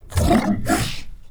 Infested Shotgun Idle; Weapons

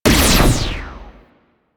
Flux Rifle Shot; Crp Laser Rifle; BE Flux Rifle

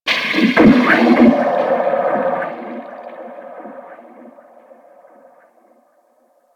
Ocean Poison Plant; Ambience; Warframe; Grineer Ocean

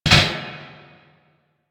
Metal Pipe Drop; Ambience; Props; Warframe; NineteenNinetyNine

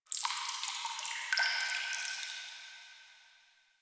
Drips, Asteroid; Ambience; Warframe; Grineer Asteroid

